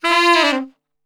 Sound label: F 2 SAX, Brass Super Section; Best Service